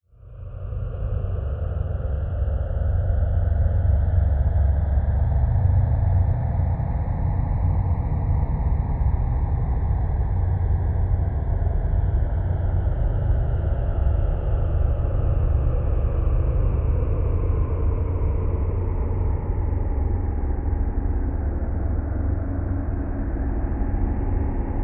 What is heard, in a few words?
Drone Musical Wind Low Tones; Production Element Imaging; AdobeCC